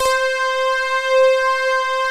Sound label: SYN E KEYS, pads; Pro-Rec - Synthsonic; TR PADS